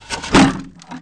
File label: Down from chair; barfly